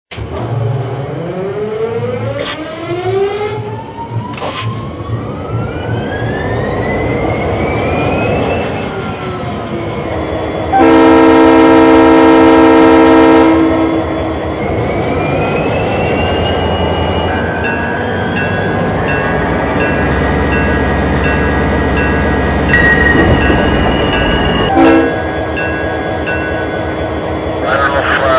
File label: EMD SD45